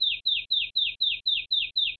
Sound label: battle item stun